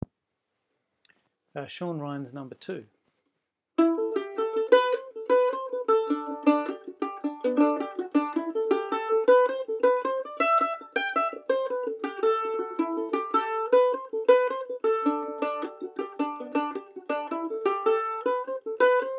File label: Jig; Sean Ryans